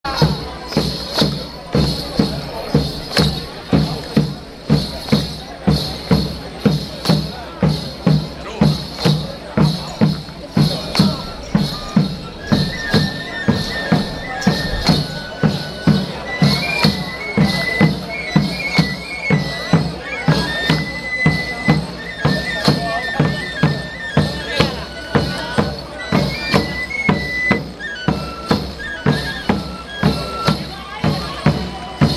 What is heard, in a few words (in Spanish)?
Tambor por la calle